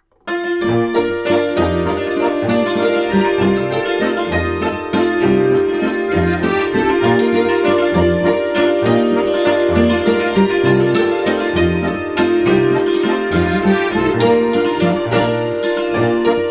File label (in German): Hackbrettlandler